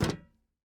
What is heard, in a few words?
metal land; teardown; ps5 windows xbox series xs